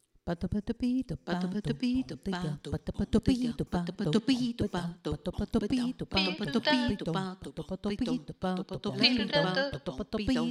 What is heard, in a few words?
insistent groove